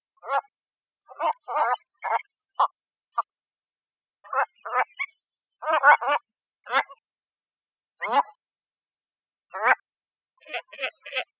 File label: White faced Ibis; ASU; Ask A Biologist